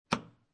Light Switch Flick Off, Household, Light Switch, Standard Wall Light Switch, Flick Off